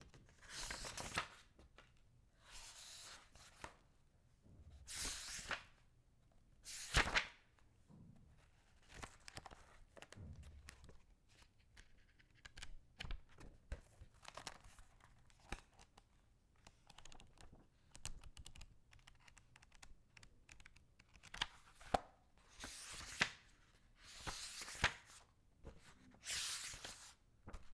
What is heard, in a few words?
Book Pages